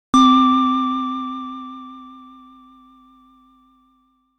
SYNTH Clean High

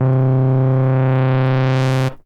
Filthy Silky Vinyl Synths C2; vinyl leads

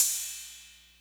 Cymbal Lite

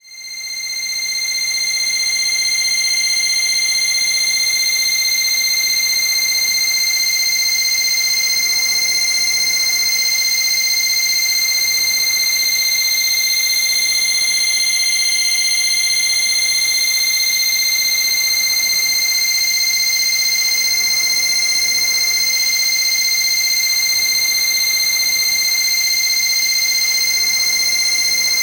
Return Home Kawaii C6

